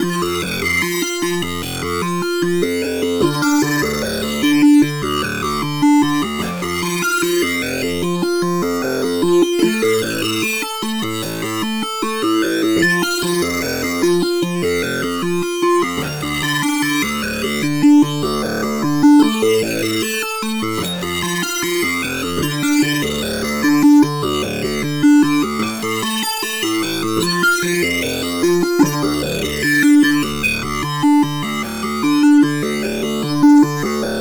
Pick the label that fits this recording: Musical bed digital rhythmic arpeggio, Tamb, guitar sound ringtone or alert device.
Musical bed digital rhythmic arpeggio